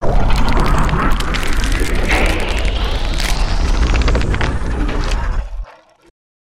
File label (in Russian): inoplanetnaya forma zhizni